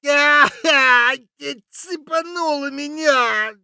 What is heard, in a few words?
hurt light; Escape from Tarkov